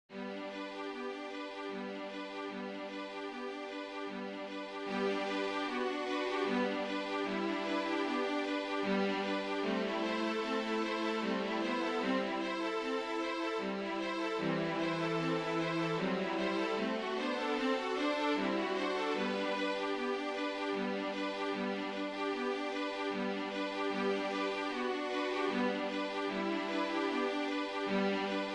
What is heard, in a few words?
Trio Swan String trio; (Alto Flute, Violin & Cello Trio Version); Swan - Saint-Saens